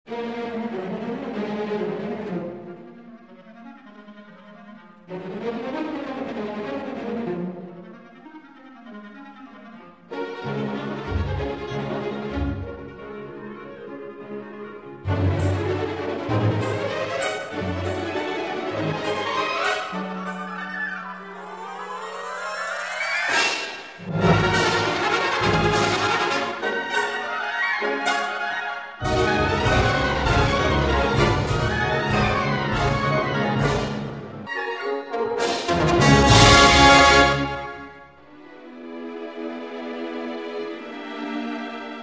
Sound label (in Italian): corde